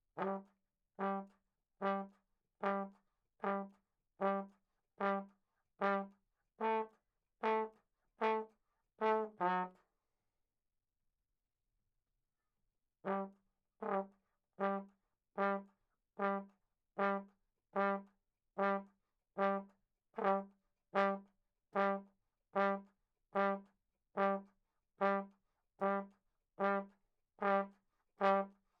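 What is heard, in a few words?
RAGTIME trombone 3 room L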